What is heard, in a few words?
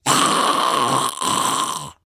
Grave Zombie death